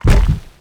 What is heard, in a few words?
impact stone light